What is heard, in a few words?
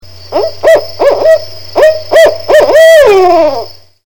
Barred Owl Hooting